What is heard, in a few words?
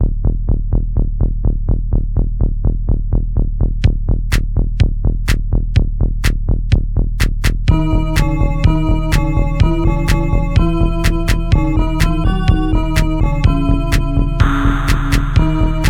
Evil boss